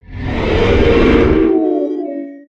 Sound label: howl; creatures organic